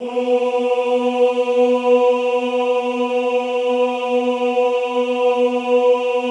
OOH B2, Classical Choir; Best Service; Extended Classical Choir; OOH MIX

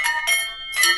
WIND CHIME; SFX; AKAI S-Series CD-ROM Sound Library VOL-8

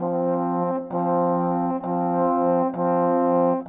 Horns, Orchestral; Sonic Foundry (Sony Creative Software) - Orchestral VOL-2; Modern 38

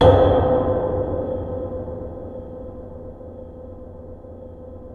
space bass G; InVision Interactive; Lightware VOL-1; Instruments & Percussions; DEMBY

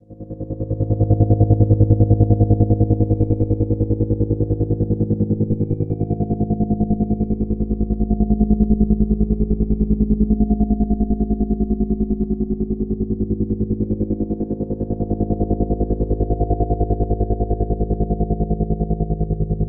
Stuttered Drone; Sonic Foundry (Sony Creative Software)

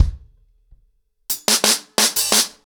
ACOUSTIC DRUMS, 90 BPM

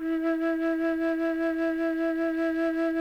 CHAMB FLUTE F3; Sonic Reality; Vintage Timetraveler

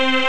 string; ST-XX Amiga Soundtracker Sample Packs

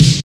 snare, Disco House

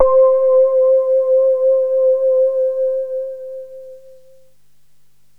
synt, Juno-2; Giga Samples Collection; Bass

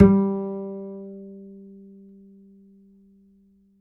G, Upright Acoustic Bass; Larry Seyer; SWITCHABLE POSITIONS

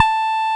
Roxichord, E.Piano

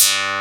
ELECTRONICA; Pro-Rec - Synthsonic; Z1 TECHNO

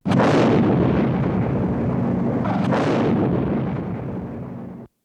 HOWITZER, military; Greytsounds VOL-1

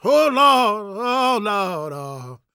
GOSPEL MALE; Spectrasonics Vocal Planet

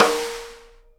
snare RIM RING, Live Snares; E-MU Producer Series Vol. 8 – Platinum Phatt (CD 1)

